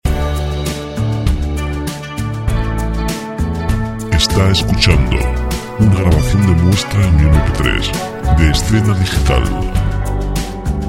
romantic song

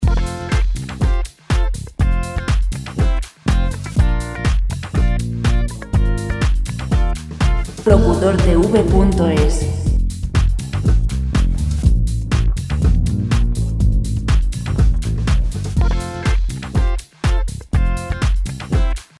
122 pop; Música de fondo sin derechos de autor

